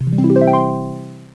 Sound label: Harp Chime; Curse of Dragor